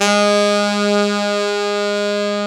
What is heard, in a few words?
A SAX